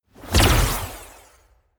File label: whoosh short jinx